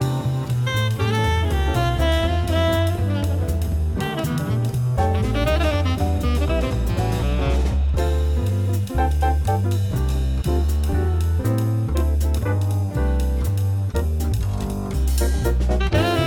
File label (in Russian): Легко Музыка для чтения